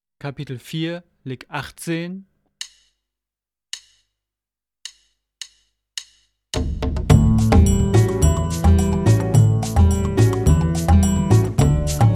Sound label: Latin Style Guitar